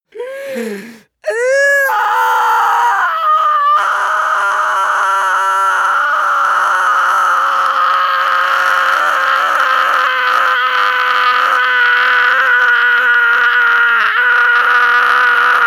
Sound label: male inhale exhale scream long; menschen; schreie